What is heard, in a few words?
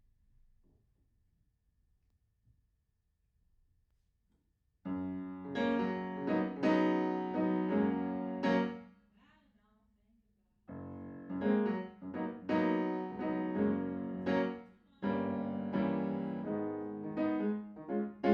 Piano Room